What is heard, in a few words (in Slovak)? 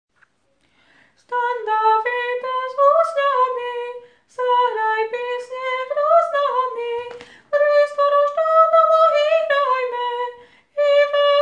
standavidesopran